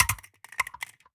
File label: phys mag drop smg metal concrete, magazine drops